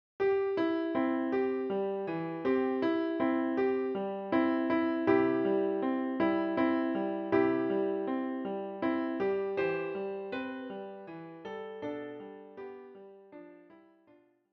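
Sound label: piano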